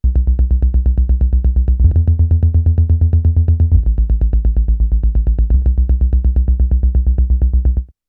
Modal Argon8

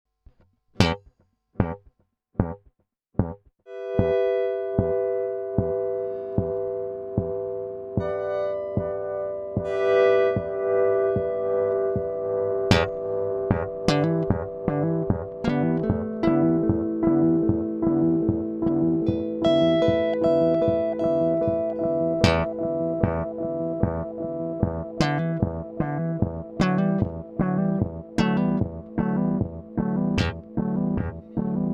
DM 2w Custom Mode Max. Repeat Rate

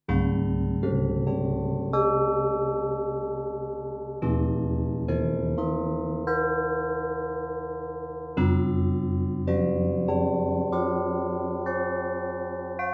bells

